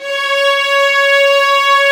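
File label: violas, ambience, mezzo forte; String Master Series; Vlas Sect3-4; Amb mf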